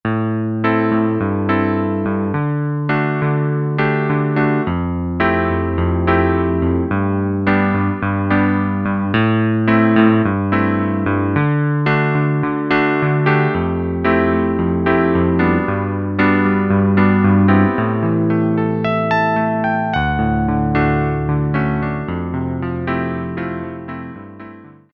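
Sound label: Piano E. Grand; Keyboard Instruments; Roland L-CDX-02 Keyboard Instruments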